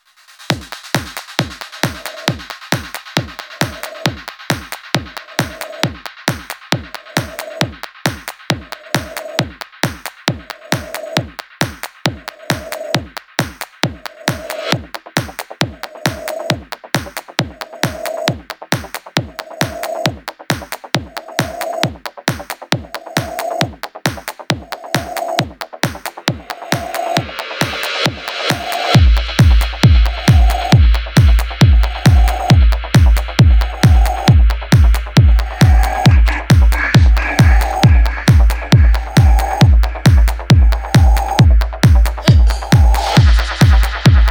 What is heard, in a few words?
Keep The Bass, techhouse